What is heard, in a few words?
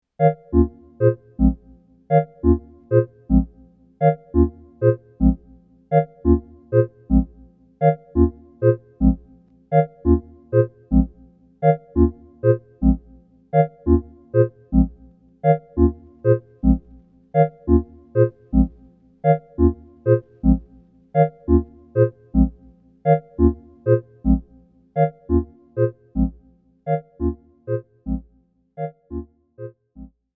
Dub